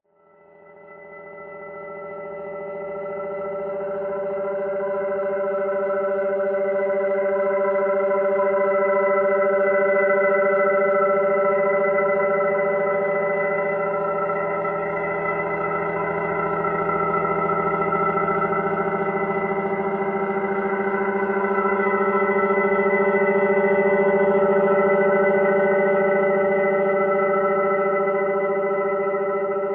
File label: Flashback of Fear; Glitchedtones; Atmosfear